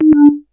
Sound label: combo break; [SPEED] Oka Ultimate khzAzerite